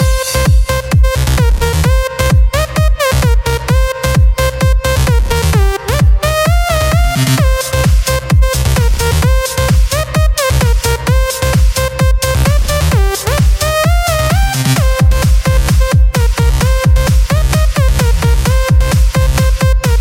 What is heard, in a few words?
Tone